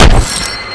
cgun shot

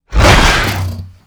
pinky attack melee, monsters vocalizations; DoomRTX

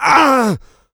pain large, player; DoomRTX; big

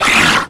imp pain, monsters; DoomRTX; imp5

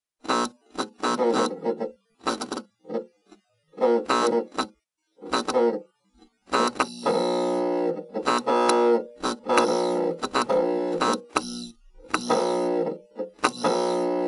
loop broken light; Doom

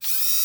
Pinky servo; monsters vocalizations; DoomRTX

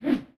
fat woosh miss; DoomRTX

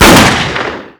Pistol shot short; Foley; Doom

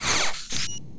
retch servo move; Quake 4 Alpha

